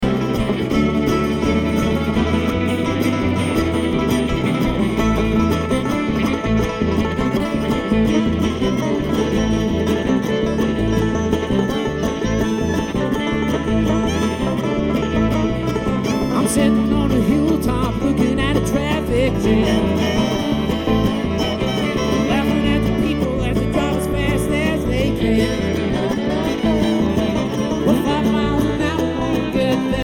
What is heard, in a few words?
Traffic Jam